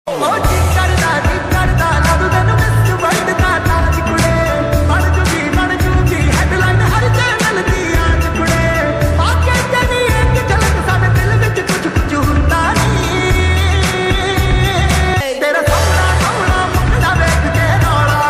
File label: lofi hindi ringtone